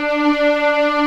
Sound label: D4 LEG, String Collection; East West; The Ultimate String Collection